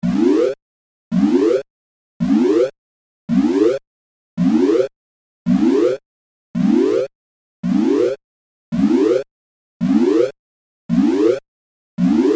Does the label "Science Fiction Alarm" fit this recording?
Yes